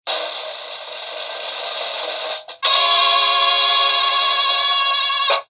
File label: drum roll fanfare